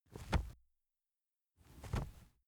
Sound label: wing flap leather single; natur-tiere; voegel